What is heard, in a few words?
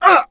damage; mohaa; dialogue